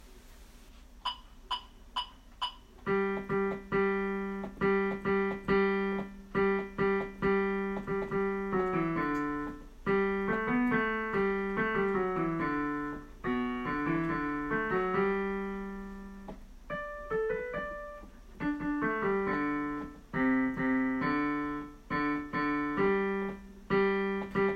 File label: Bass